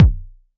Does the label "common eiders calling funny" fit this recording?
No